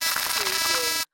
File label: Electrical Short; Sound Effects Bible